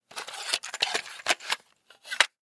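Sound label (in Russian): zvuk kassety dostali i perevernuli storonu, cassette taken out and flipped to the other side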